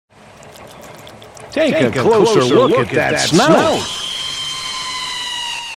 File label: reee; cave